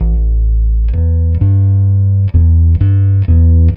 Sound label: Moody, Bass Drum - Kicks